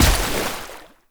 Heavy Step Water, Footsteps; Enemies; Foley; Warframe; Tau Dax

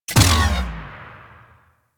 Turret Energy Ball Shot; Enemies; Cart Turret; Warframe; Venus; Venus Turrets; Venus Cart Turret Energy Ball Agent

